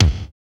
kick disco, ambient kick; Roland L-CDX-01

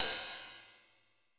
ride, Roland MC-909

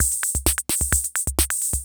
CR78

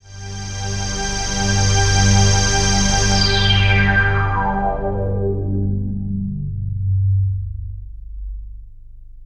Analog Fade In; SFX & One-Shots

